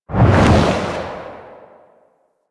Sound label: Reaper Sprint Whoosh; Warframe; Wraith